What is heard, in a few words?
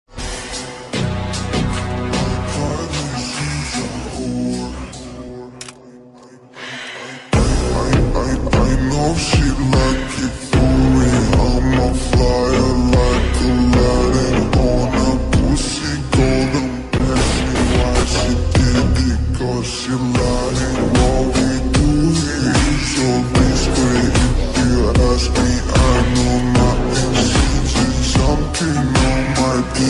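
smooth trending background music ringtone party ringtone; alight motion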